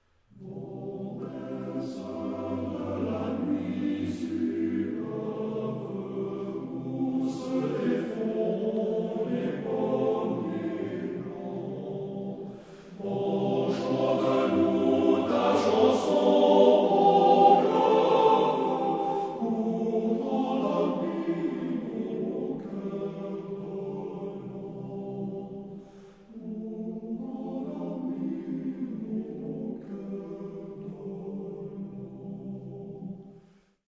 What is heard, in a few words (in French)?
Chanson Du Vent Clair